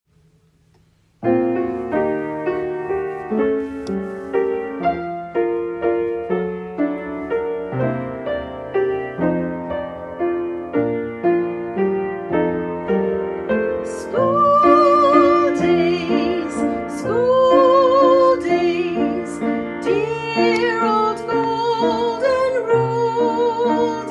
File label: School Days vocal